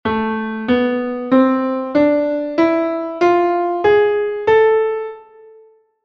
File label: harmonic scale; Major and Minor Keys